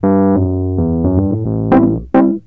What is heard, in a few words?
Rhodes, Keyboards; Drum & Bass; Total Drum&Bass